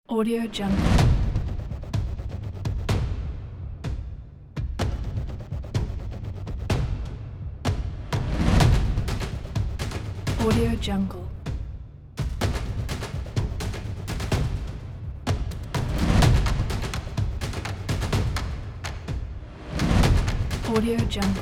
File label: action percussion